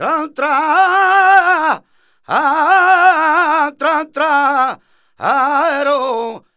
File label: voc c#m, Flamenco Vocals Male Cantaor; Male Cantaor 2